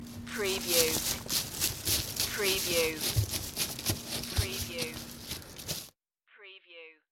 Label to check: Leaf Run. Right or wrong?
Right